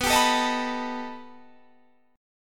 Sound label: B Augmented 7th B down Guitar Standard; B+7 strummed; capo=3